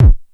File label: KLONE KICK DRY, Klone Dual Percussion Synthesiser, DRUMHITS MONO